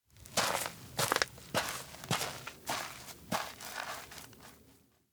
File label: steps outdoor leave lake; anemoi